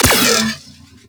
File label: Shot, Weapons, Sentinel; Warframe; Verglas